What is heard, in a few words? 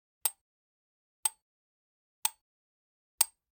Generic Click Glass Tiny; boom library; The Interface